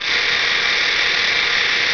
sizzle

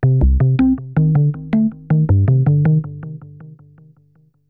SNTH BASS AMBNT 160 C; Ambient

